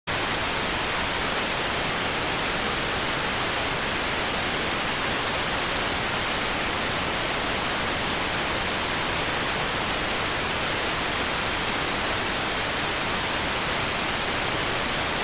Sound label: rtty rx